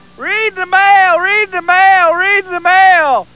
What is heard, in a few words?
mail